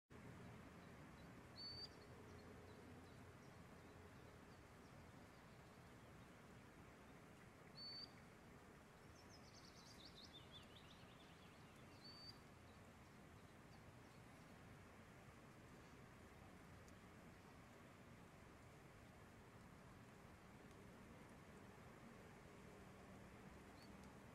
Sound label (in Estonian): Vihitaja ehk jogitilder Actitis hypoleucos